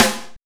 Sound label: Snares, Real